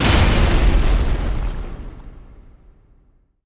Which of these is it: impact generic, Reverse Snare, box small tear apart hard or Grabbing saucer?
impact generic